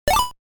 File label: hop; Frogger; Arcade